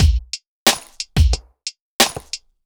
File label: Drums, Cinematic Funk; Sonic Foundry (Sony Creative Software); Urban Tone Poems